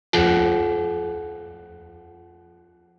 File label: gone; SE